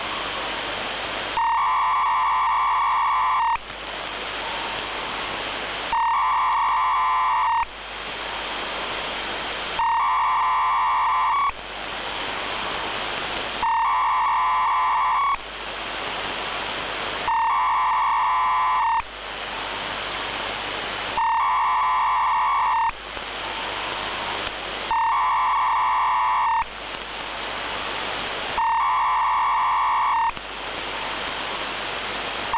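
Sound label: 14646 kHz SSB digital unidentified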